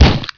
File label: fling